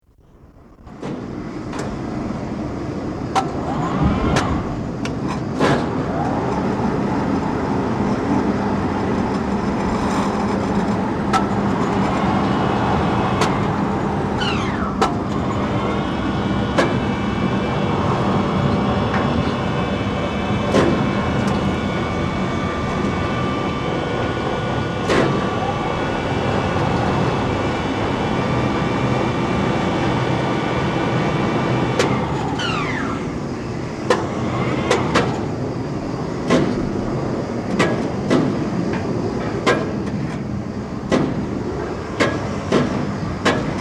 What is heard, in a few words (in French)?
grue, chantier; Culture sonore